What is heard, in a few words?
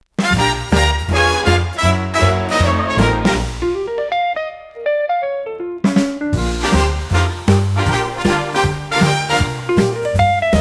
vinyl nights 158bpm, BigBandSwing